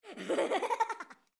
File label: Malicious Laughter; Blind Quest - The Frost Demon